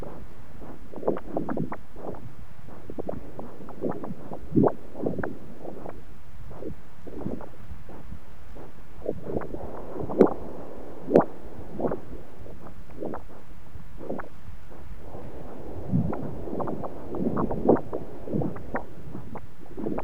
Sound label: with bubbles